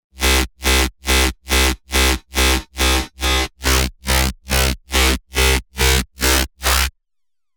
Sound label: Bass; Bass Withdrawal; Revealed Serum 2 Rave Asylum